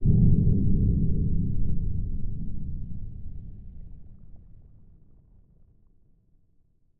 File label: Heavy Stinger Low End; horror and atmosphere fx; Silent Hill Downpour